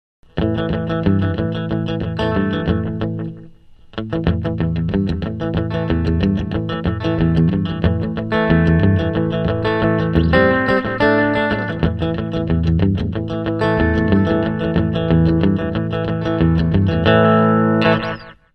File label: Delay